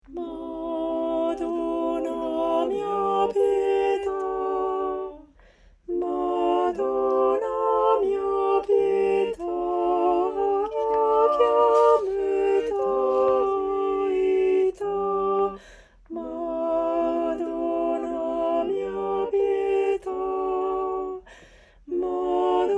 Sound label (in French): sopranes; Madonna mia pieta